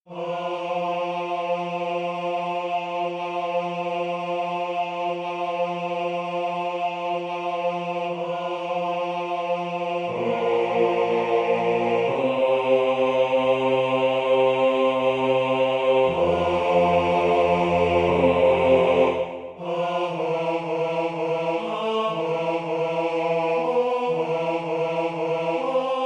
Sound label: ALL THINGS BRIGHT AND BEAUTIFUL Bass